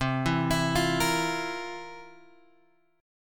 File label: C 11th C; C11 Chord {8 7 X 9 6 6} arpeggiated